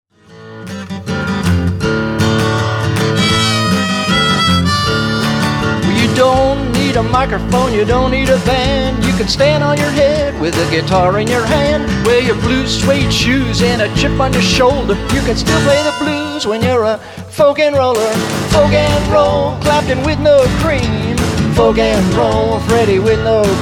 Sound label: Folk n Roll